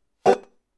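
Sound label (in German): gedaempfter abschlag, banjo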